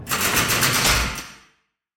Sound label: jail door normal; soundscrate